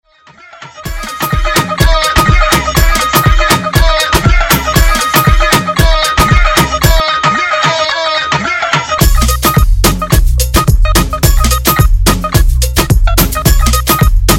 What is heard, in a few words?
Transition Intro Outro Clean 128 108 Bpm